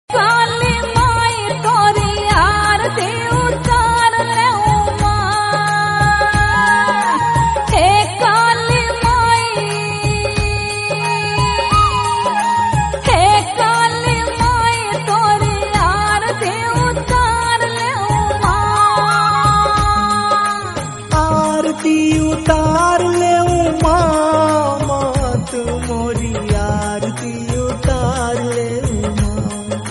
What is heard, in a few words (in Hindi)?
he rajaram teri aarti utaru devotional